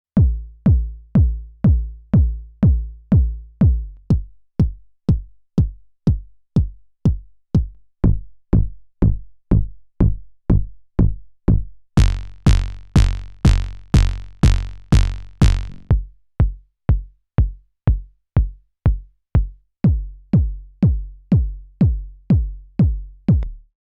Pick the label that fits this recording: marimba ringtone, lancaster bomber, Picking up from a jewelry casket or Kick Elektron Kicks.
Kick Elektron Kicks